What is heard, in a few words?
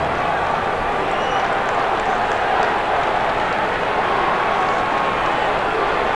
Shoop Roar; Intel; Createshare; PC Camera Games